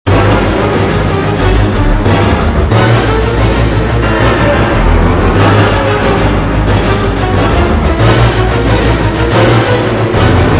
suspense, music; revil

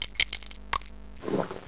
small medkit; items

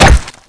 swing hit; minigolf

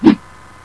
hammer miss; weapons

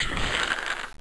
hc pain, handcrab; monsters; AoMDC

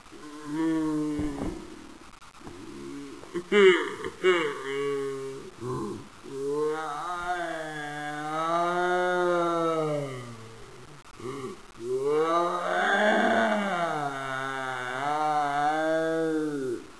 cry, saker; AoMClassic

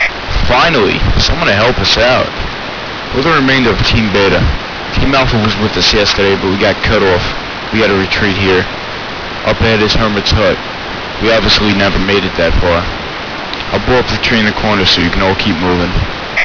grunt, leprechaun; svencoop

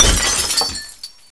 glass, debris

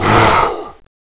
hellhound pain; monsters

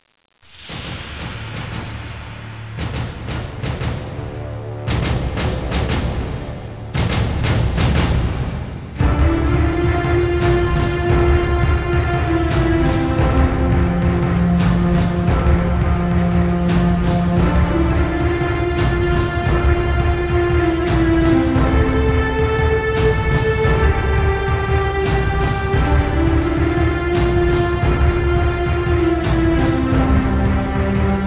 end song

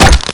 swing hit; minigolf

